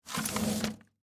Mail Box Remove, Household, Mail, Box, Remove, Large, Envelope, Mail; Miscellaneous Household; BlastWave